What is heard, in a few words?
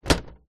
electronic typewriter plastic paper feed flap movement; office; brother ax 450